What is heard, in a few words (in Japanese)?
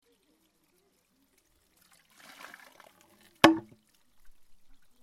shishiodoshi